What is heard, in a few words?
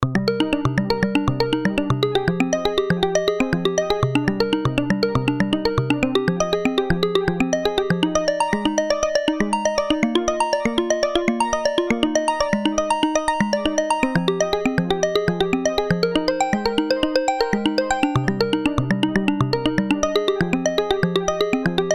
behringer ubxa Seq Plus Arp